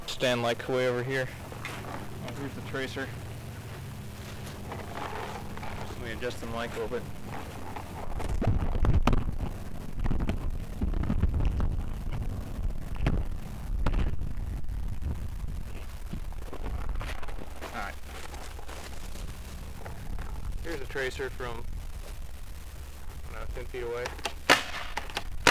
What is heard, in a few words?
shotgun; trracer; mono; guns